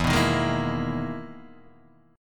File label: E-Major Sharp 11th E, Guitar DADGAD